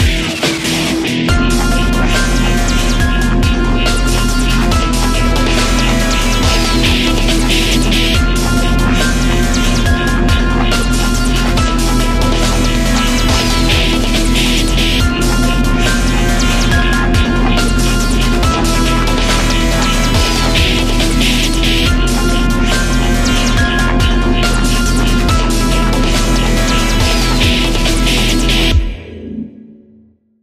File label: rebel snarl